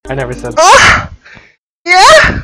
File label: moan